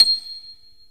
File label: Piano Key C